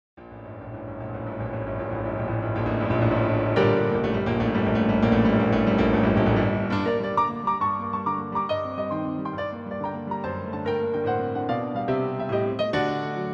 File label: Piano Concerto; No 2 Op 22 3rd Movement; Saint Saens